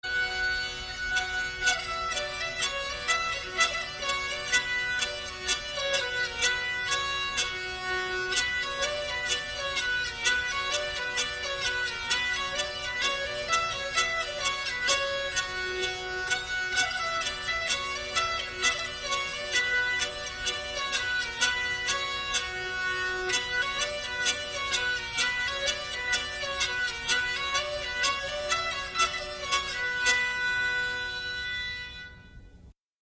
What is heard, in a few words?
Hurdy Solo